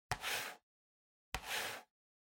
PC Mouse Move Hard Pad Hit

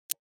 Smartphone Lock Unlock, Dry

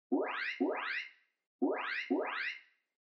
Emergency Alert (Far)

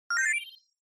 Short Accent Delay Pitch Shift

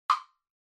Woodblock Dry Mid

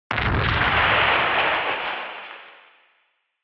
Retro Anime Robot Low Long

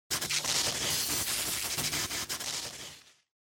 Electric Shock Short

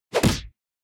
Hit Block High

